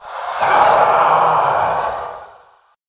Party Elemental Death

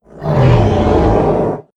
Kodo Beast Death

